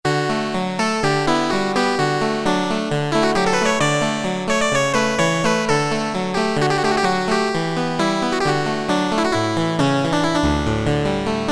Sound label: Harpsi, Roland MT-32